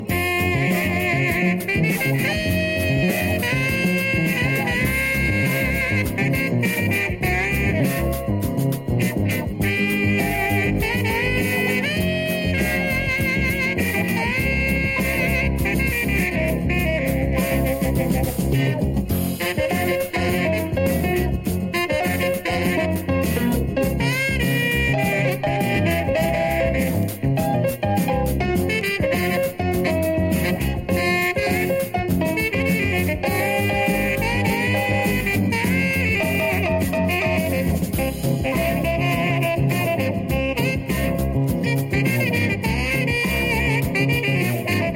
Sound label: Sax Rock